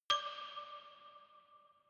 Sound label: notification glockenspiel creepy single low